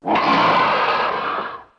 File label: lose; npc; bowser